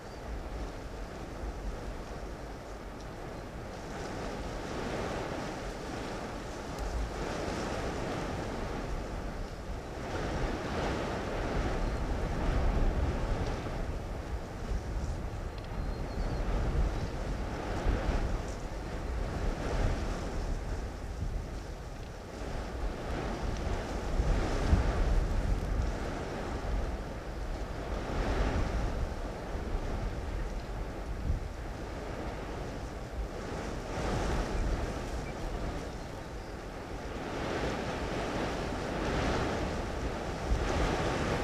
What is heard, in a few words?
wind; Golden Crayon Sounds